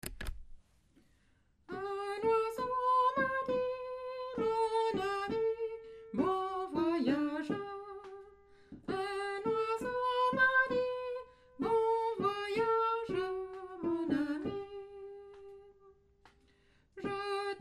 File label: soprano; un oiseau ma dit